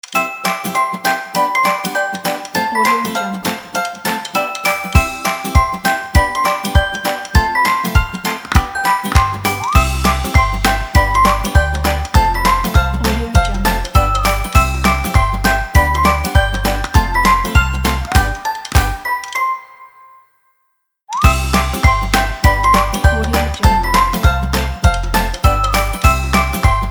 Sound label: happy ident